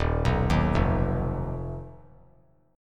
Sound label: Eb Suspended 2nd Sharp 5th; Ebsus2#5 arpeggiated; JGuitar